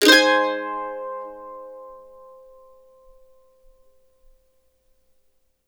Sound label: CEE CHORDS G#, Blues Guitar